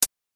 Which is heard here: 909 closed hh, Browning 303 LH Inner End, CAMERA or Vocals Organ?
909 closed hh